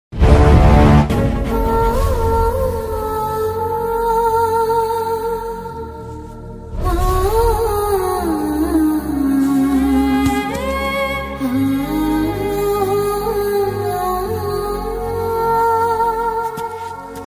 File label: love ringtone hindi love ringtone; magadheera